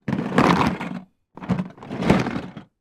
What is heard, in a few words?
toy duplo large box tip movement